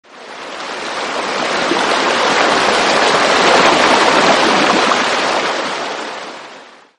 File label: Mobile ringtone